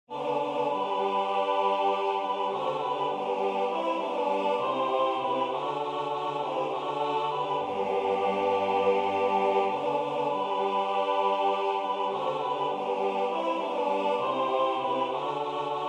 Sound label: African Song Tutti; Chant d'Afrique du Sud; Siyahamba; Concert « Amour et Traditions »